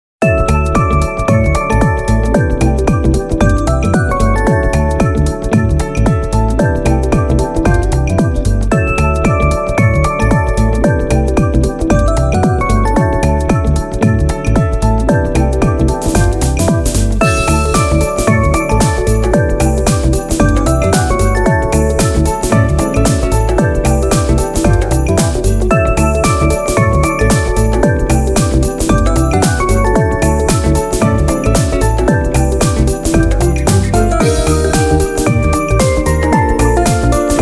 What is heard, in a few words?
Music Menu event